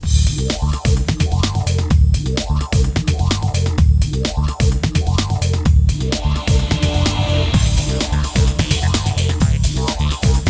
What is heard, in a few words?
Battle